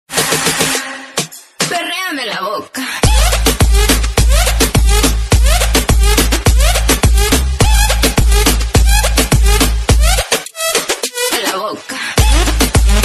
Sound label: trippy trance, psy